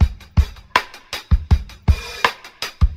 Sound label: Drum loops, Boombap